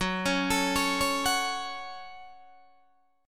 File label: Gb Minor Gb Guitar Open D